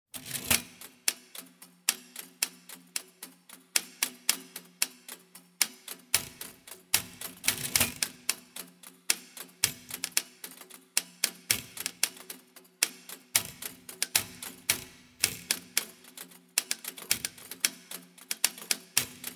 Typewriter Sonata

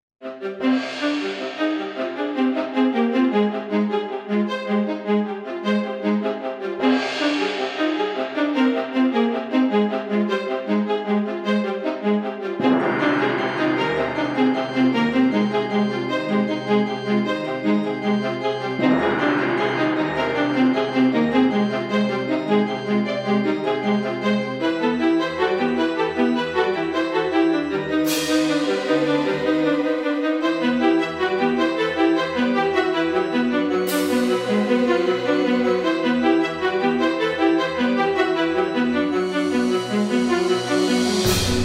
Intense Orchestral

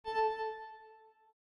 button

